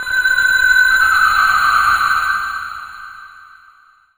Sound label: HALL TONE, Anime Sound FX, PSYCHO